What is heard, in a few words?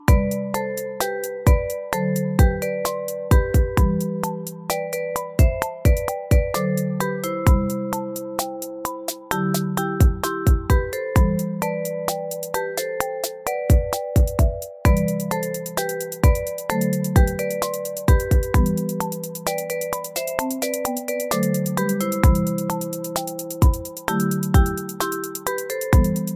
Grande Finale Trap